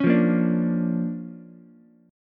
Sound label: D-Minor Major 7th Sharp 5th-D-down-Ukulele; DmM7#5 strummed; JGuitar